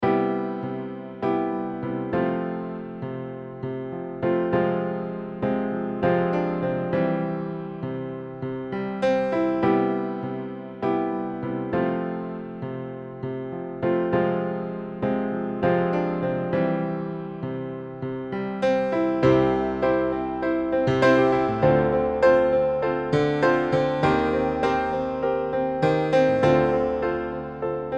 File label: beat